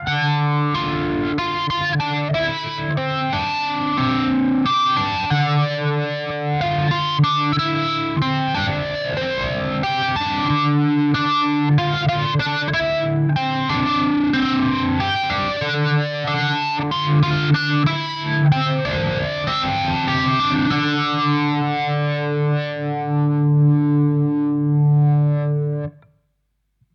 Boss GT-1000 Core Modulation Flanger; Vergleichstest

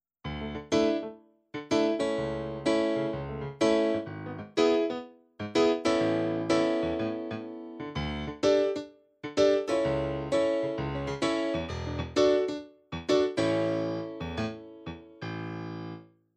NumaStage Stage Grand

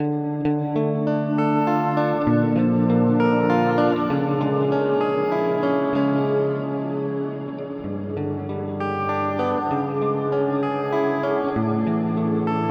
Mooer R7 X2 Dream

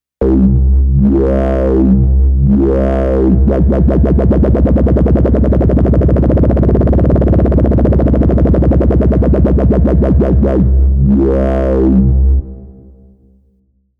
LFO

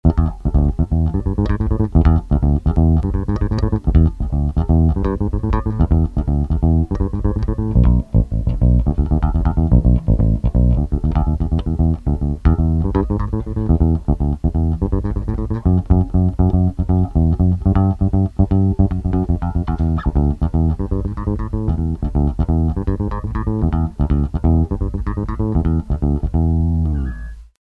Funky

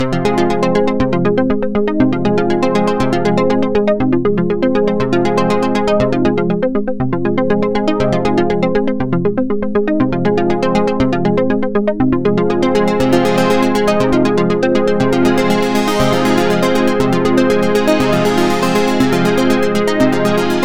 Waving Sequence; Softube Model 84

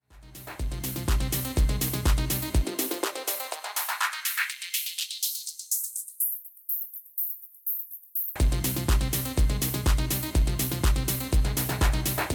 Pioneer DDJ SB3 Fade FX

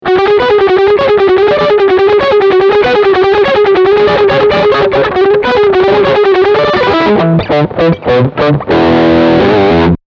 Fast Picking Distortion

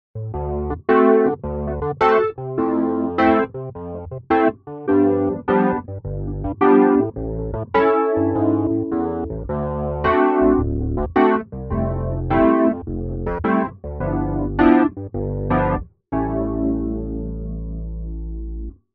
Yamaha CP88 E Piano

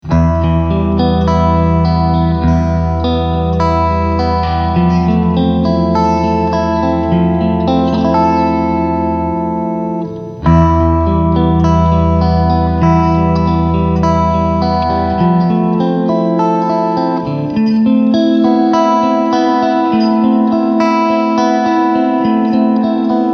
Chorus Reverb

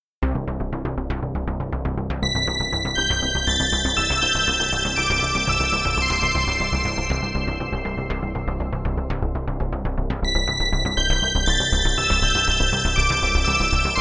Keys dry; Drawmer 1972; Bounce Pan LR

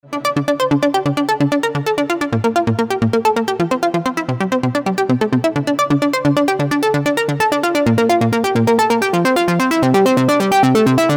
SH-2 Seq

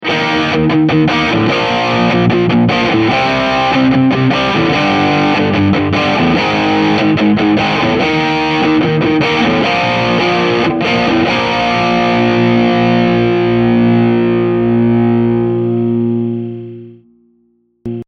rock riff

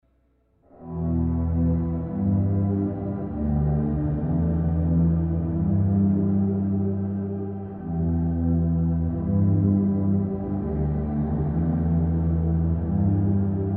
Reverb Swellr; Specular Tempus

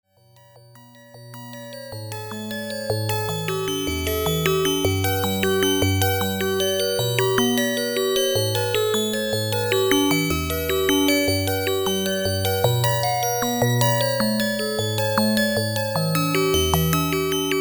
P600 Pan Arp

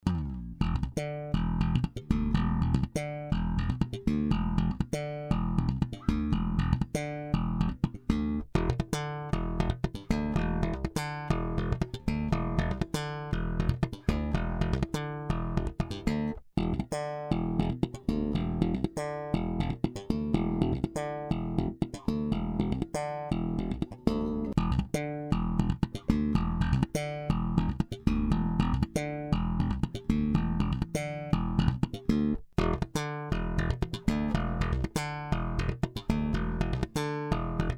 Active 3 PUs Passiv 3 PUs No EQ

